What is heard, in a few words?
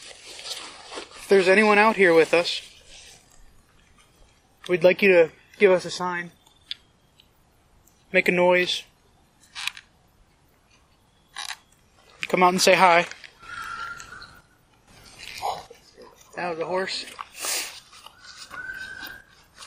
Blockhouse Breath; blennerhassett island; case study 4 investigations